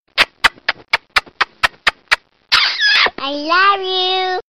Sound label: Kisses